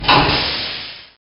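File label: SFX switch work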